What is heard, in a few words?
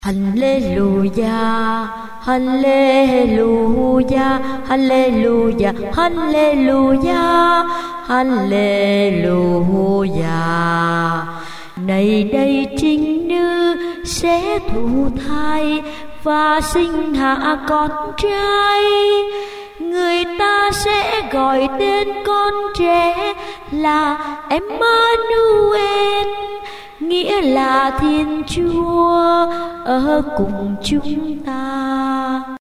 alto basso